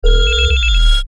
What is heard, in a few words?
Telephony Stinger Bass Wah